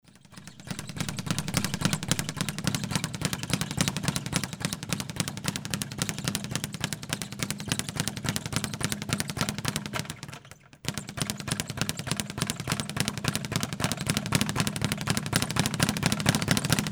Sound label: boxer training